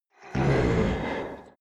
demon heavy breathing groan; kreaturen

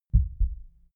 heart beat double quiet; comedy-cartoon; koerpergeraeusche

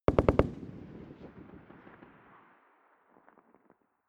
rifle ak-47 exterior fire burst distant; Firearms; schusswaffen

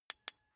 car key button click signal

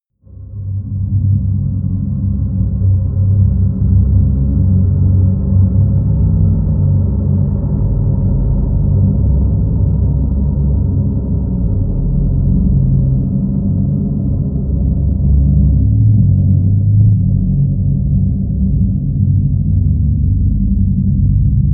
drone noise

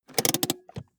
car bmw e46 interior handbrake pull up

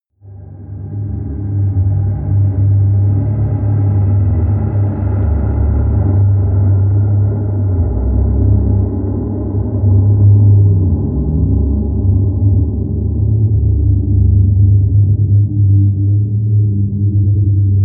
drone splice

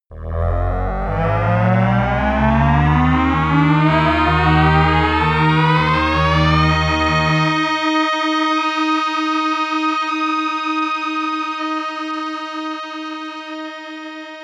long representing rise, risers

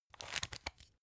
pepper mill slow; berufe; gastronomie; soundpool

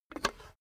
cash box metal lid open hard; maschinen; soundpool

